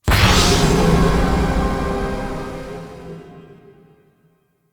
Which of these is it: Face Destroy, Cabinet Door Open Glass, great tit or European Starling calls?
Face Destroy